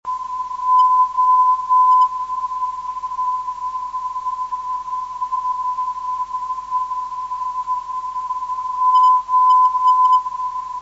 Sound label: 360 kHz, ndb day time